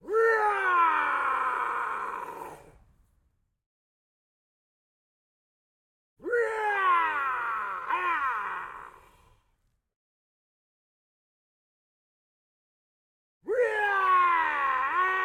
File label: War Unit - Solo Man, Charge Attack, Long, Ya, Small Crowds Outdoors; ORTF; Game Audio Factory